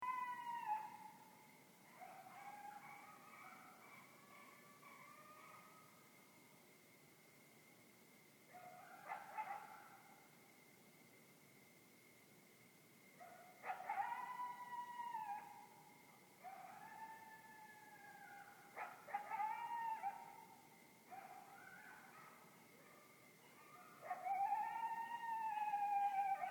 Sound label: Howl